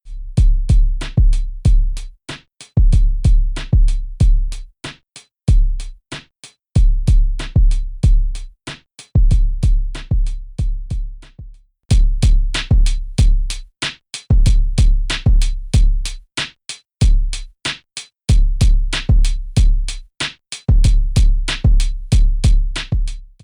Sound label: Hiphop Loop 1073; arturia